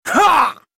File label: attack male